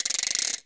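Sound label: ratchet forward, percussion; ILIO; Synclavier Percussion 2; World & Orchestral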